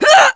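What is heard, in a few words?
Vox Damage, korean; Nicky; kingsraid; voices heroes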